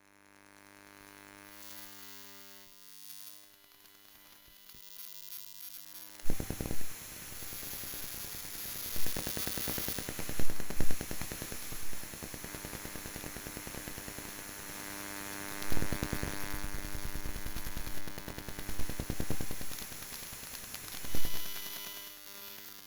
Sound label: Emissions Modem